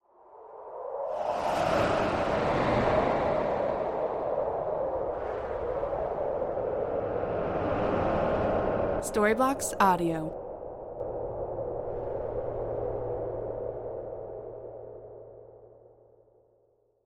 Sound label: ghostly wind eerie haunting